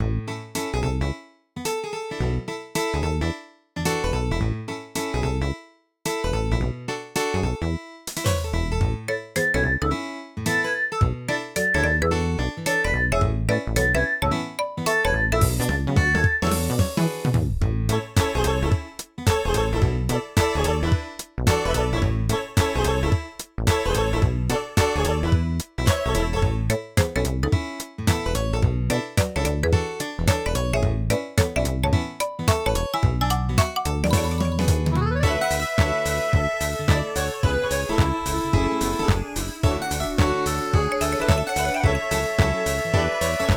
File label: Bgm trial; butter pretzel